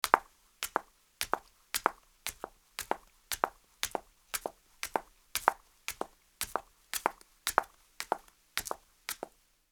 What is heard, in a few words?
Footsteps Heels Concrete Up